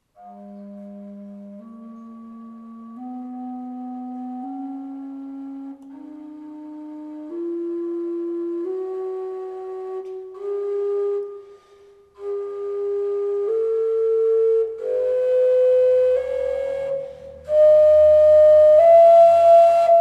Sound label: scale, melodic fujara